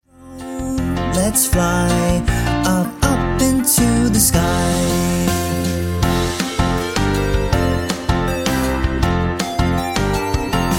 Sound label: Drone Safety Song